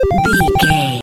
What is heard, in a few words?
retro beeps collect item